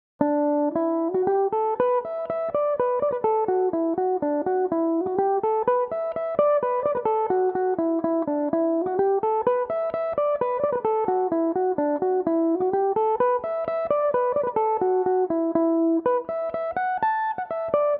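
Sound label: polka E dorian, Irish mandolin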